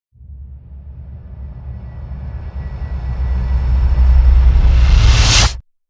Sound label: Production Music Trailer Tools Suckback; CATastrophic